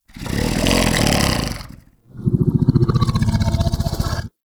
Undazoa Vocal Snore; Warframe; Hunting Critters; Characters

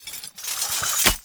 Idle Sword Twirl; Arthur Hub; Nineteen Ninety Nine

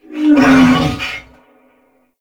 Wolf Pain; Animals; Duviri

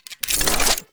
Pod Shotgun Reload; Warframe; Weapons; Tenno

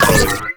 Console Deactivate; Warframe; The New War; Orb Vallis; Narmer

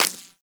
Bullet Impact Ricochet; Weapons; Bullet Impacts; Warframe

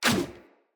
Hunter Bow Melee Whoosh; Weapons; Player; Bows; EnvoyHunterBowRangedMelee

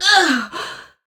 Death; Warframe; Tau; Dax; Ninja

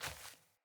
Sandals Walk Dirt, footsteps; Player

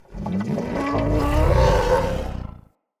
Corrupted Battle Dog Yawn, Animals, Vocals

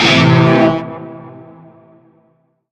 Shawzin Maj Chord I; Music; Warframe; Narmer

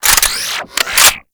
Hand Rocket Launcher Reload, Weapons; Warframe; Corpus

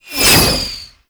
Quick Strike Projectile Pass By; Enemies; Abilities; Warframe; Tau; Roathe

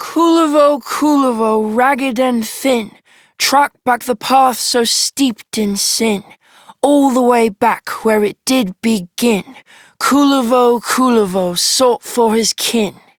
Child M, Childrens Rhymes; Warframe; Duviri; Kullervo

